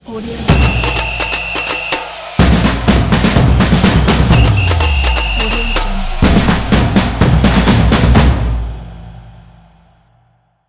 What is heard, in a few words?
soccer logo; heartdrummachine